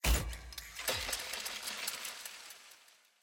artifact click; jinx

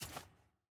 ui generic section paper click

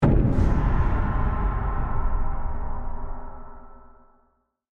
sfx ui match found; jade

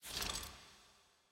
ui click; main act left

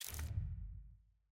map scroll click

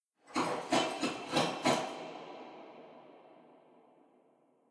walk run

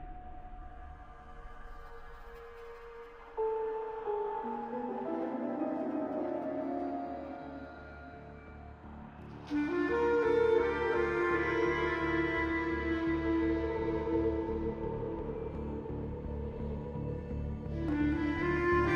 theme; renata

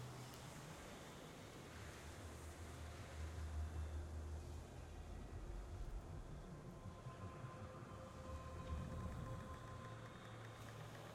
amb forest safespot; ionia

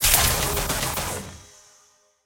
ultimate; lucian

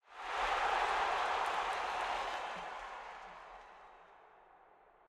crowd cheer

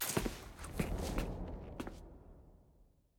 sfx trans collection archive return click; jayce

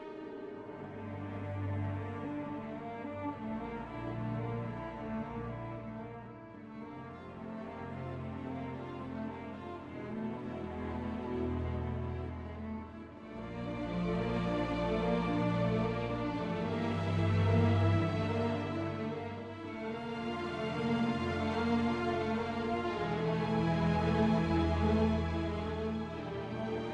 mood loop tragic

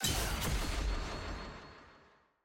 skin click; naafiri

